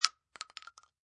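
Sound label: Bullet Shell Drop Shotgun 12 Gauge Cement; empty Shotgun 12 Gauge shell falls on cement; Impact Sound Effects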